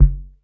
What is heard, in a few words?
Kick; Vari Breaks; pitchglitch samples